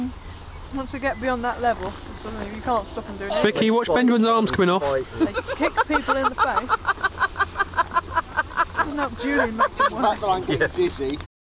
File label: swing, picnic